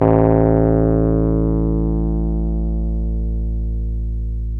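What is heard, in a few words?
piano, Wurly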